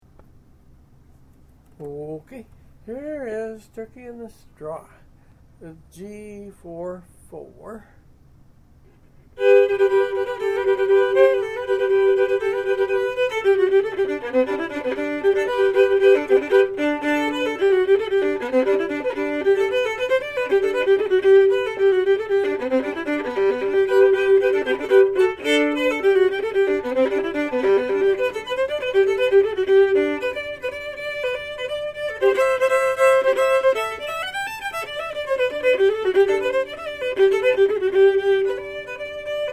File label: Turkey Straw, Fiddle chords Reels